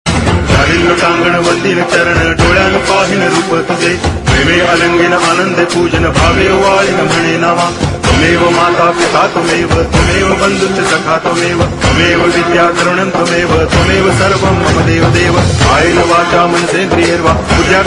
achyutam keshavam ram narayanam fast ringtone hare ram hare ram hare krishna hare ram fast